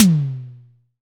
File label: high floor tom single hit b key